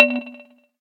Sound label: electric sharp, keytone; SKY IM-A730S-VEGA-S